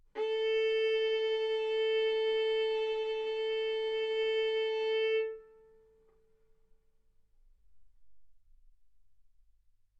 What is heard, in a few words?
Violin arco A4 B4; mf; sul A; stereo